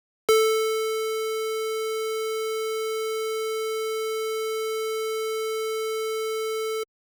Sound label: square